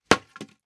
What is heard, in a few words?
Impact Torch Drop On Wood; Impacts